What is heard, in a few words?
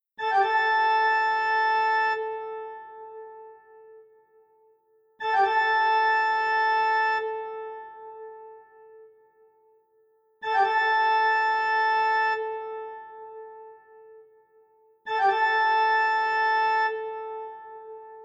Toccata & Fugue in D Minor; Bach